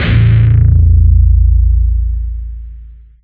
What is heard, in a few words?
fuzz; Tank